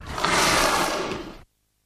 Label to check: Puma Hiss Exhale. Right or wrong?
Right